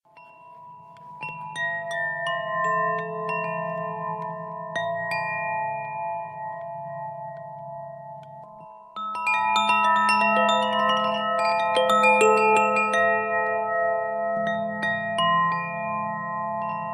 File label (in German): klangspiel; zaphir bluemoon